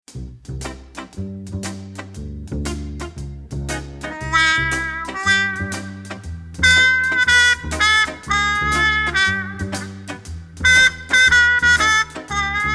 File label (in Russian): truba